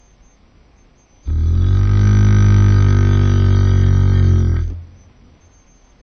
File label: Edmontosaurus, Dinosaur Roars